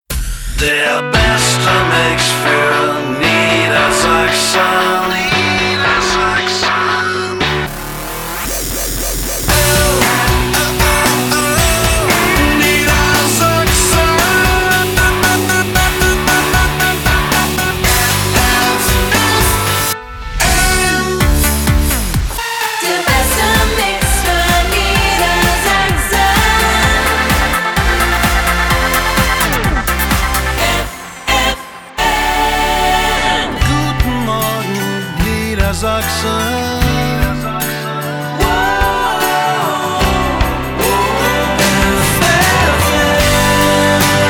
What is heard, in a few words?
Jingles; ffn